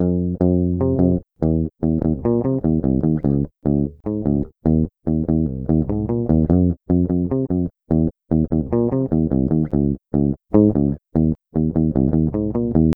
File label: Lead guitar